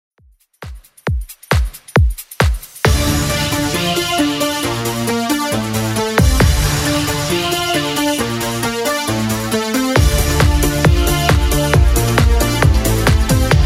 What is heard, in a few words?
90s, 135bpm; Redrum